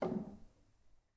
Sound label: rel, Grand; bitKlavier; Binaural